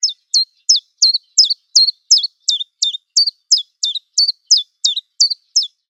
tjiftjaf

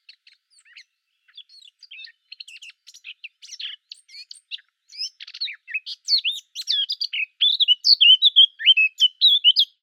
zwartkop

